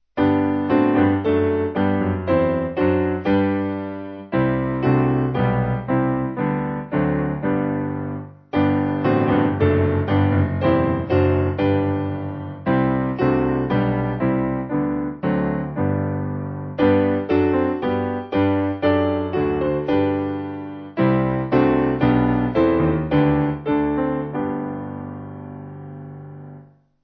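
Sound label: Piano, backing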